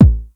TR909 BD; East West - Global Sounds

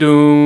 VOX DOON D4, Scats Choir; 90s Sample CDs; Club-50 - Foundations Roland; Short Scats

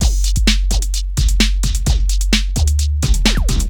drum 130 electro, drumloops; Techno Trance Essentials

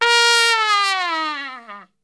A#2, Brass; Best Service; Brass Super Section; TRP LNGFLL; 90 s Sample CDs

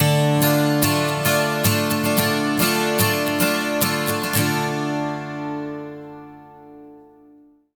MIN 12 S, Acoustic Guitar Loops; USB Soundscan vol.04 - Electric & Acoustic Guitar Loops; AKAI

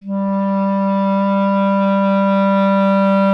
clarinet G, Solo Instruments; Miroslav Vitous - Solo Instruments VOL-1; 90 s Sample CDs

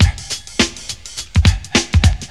BEAT, Beats 102-105bpm, Hip Hop; E-MU Formula 4000 Series Vol. 1; Hip Hop Nation

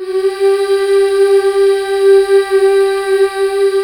MMHH G4; Best Service Gigapack 1

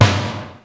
Fairlight CMI III Snare, Drum Machines

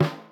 snare, Millenium MPS-400

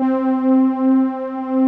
SMOOTH SYN, synths; Pro-Rec - Synthsonic

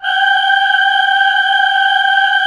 SYM CHOR G 2, Korg; Back In Time Records; Korg Universe VOL-1; Korg SYMPHONY2

